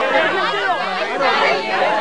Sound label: Stairs Ambient; VOODOO